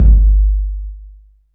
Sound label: GATED K808, GATE COLLECT; SPECL FX